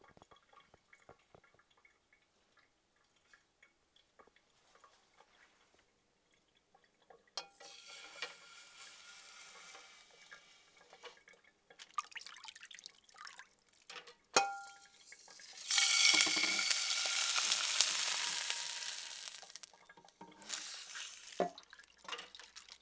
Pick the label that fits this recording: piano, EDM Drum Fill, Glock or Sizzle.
Sizzle